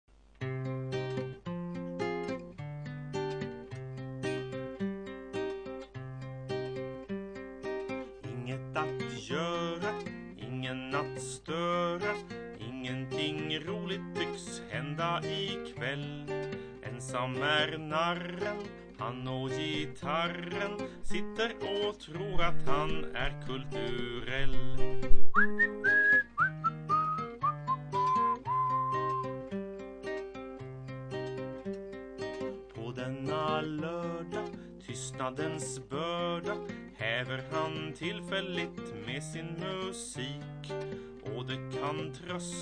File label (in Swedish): Ensam gitarr